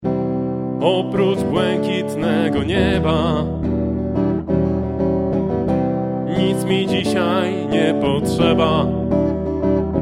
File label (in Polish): duet reverb